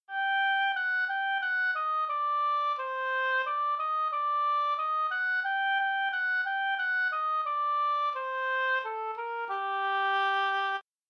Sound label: Harmonic, scales Minor